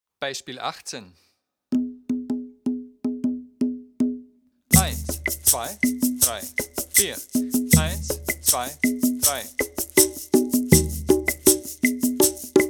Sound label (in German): viererrhythmus